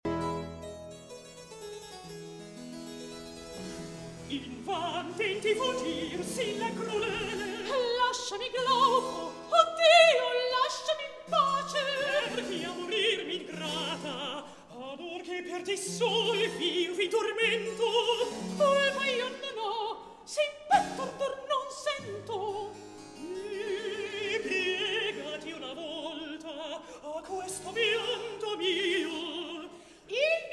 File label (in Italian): Recitativo; Invan Tenti Fugit; Polifemo